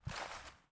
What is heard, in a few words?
Walk; portal quest android; Magic Shrek